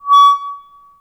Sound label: Mall Hub Stage Feedback, Ambience; Warframe; Nineteen Ninety Nine